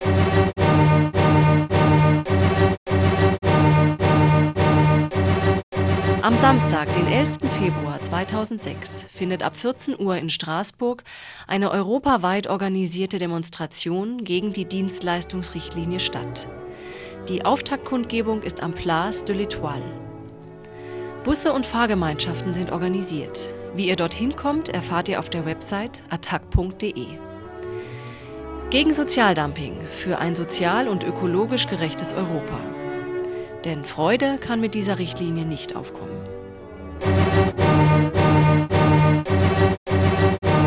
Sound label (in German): jingle; attac; strasbourg